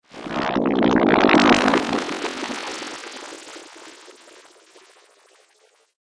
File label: Laser Muddy Beam, sci-fi laser sound effect; Laser Future - Muddy Beam